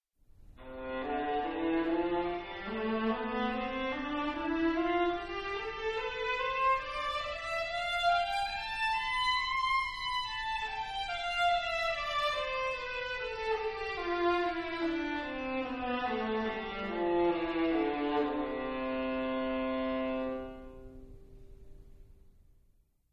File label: C major